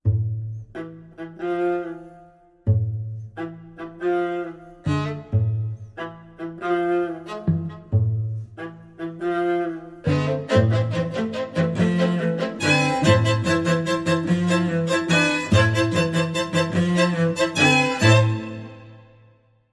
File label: Spiccato